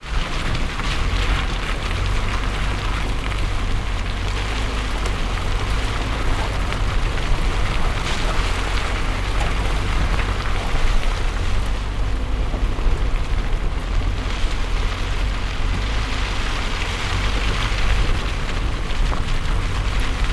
car on gravel path

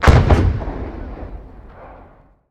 far explosion

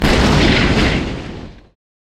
tank cannon

